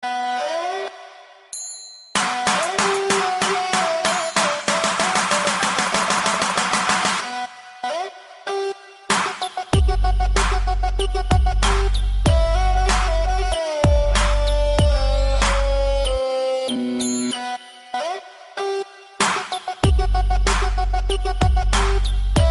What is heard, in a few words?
DOUBLE SOUND RINGTONE